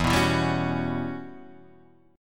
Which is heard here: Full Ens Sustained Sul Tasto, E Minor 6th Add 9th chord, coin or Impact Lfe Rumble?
E Minor 6th Add 9th chord